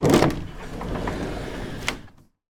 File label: door patio slide open; household; zapsplat